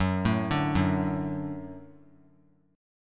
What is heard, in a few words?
F sharp Minor Sharp 5th F sharp; arpeggiated; JGuitar